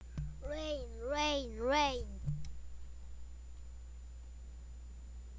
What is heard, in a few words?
rain